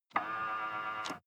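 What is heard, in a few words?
sports car interior side mirror adjust short; verkehr transport; autos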